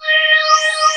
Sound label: DG WAVE, DIGI SYN